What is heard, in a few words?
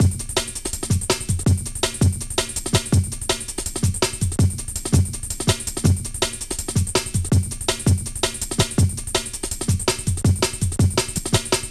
DRM, Drum & Bass; Best Service ProSamples vol.03 - Drum & Bass 1; AKAI